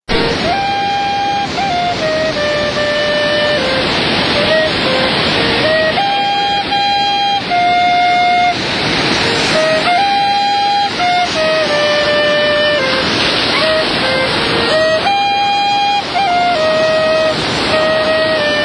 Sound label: Playing whistle on Pacific beach